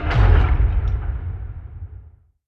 submarines engines; World Of Warships

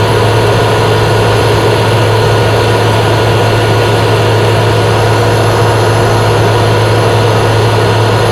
abrams idle loop; veh abrams idle v3loop

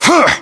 pain, voiceovers; localized english

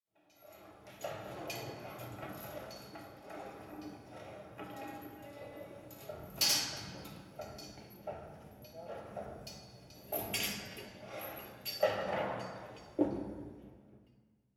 hangar layer

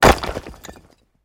building fall small; tanks; War Thunder

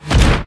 depth bomb; World Of Warships

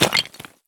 sprint tile; Escape from Tarkov

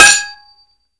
knife metal; Call of Duty Modern Warfare; physics

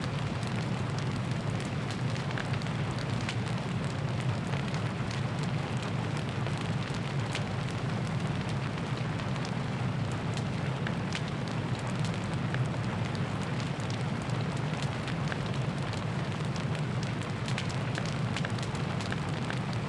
damage vehicle; World Of Warships

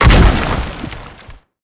impact log break

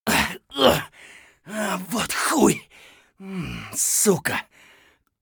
hurt neardeath; Escape from Tarkov

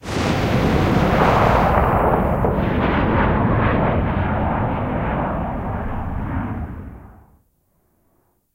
rocket tail

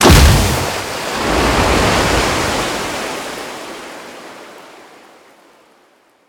damage vehicle; World Of Warships

